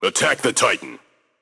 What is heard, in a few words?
vox attack m; smite; baronsamedi